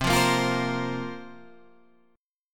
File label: C 7th Suspended 4th, guitar; C7sus4 strummed